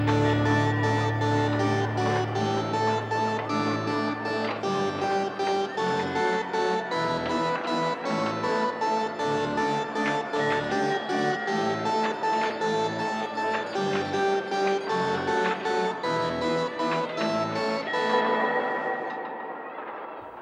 waltz

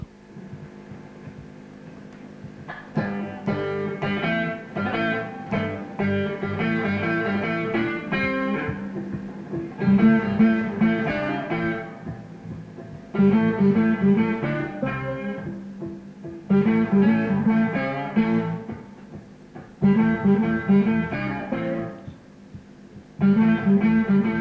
electric guitar improvisation

